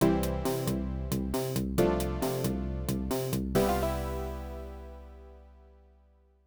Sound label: Restful (Game Over); Hishouzame; Flying Shark; X68000